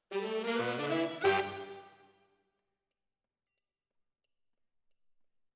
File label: ORTF SCHOEPS, piano